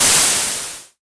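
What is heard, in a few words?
cymbal, Moog Minimoog Drums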